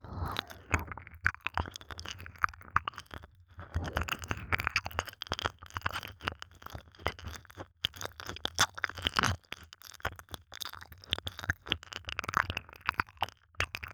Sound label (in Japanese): 耳舐め